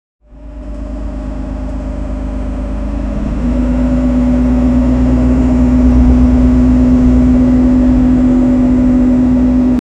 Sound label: bus engine approaching